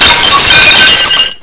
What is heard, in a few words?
glass; quake2